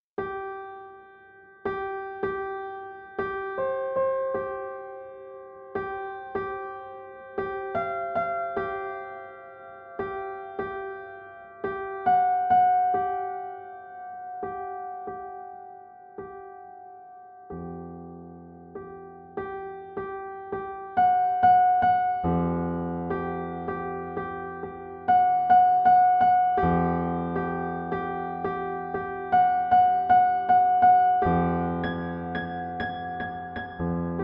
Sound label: piano 11edo; 11-ET